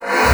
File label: AIR, BASSOON; Xsample VOL-6